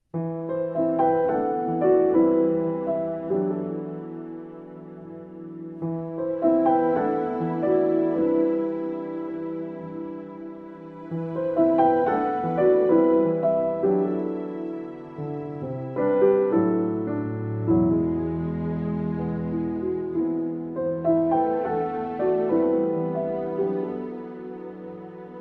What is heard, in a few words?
With Strings